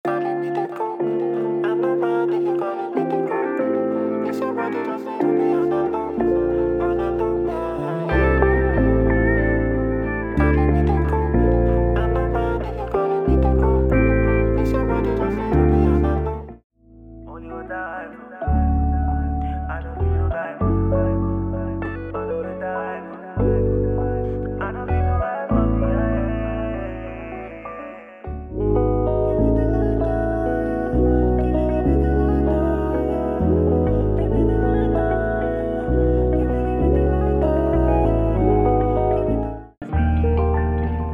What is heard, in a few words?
Afrobeats; Broken Afrobeats Vol. 1